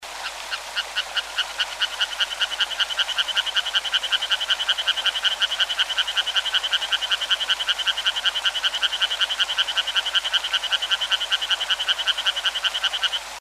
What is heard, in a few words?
Hyla sarda; BIOAC AMPH